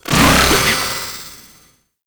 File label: Invisibility Exit; Warframe; Infested Lich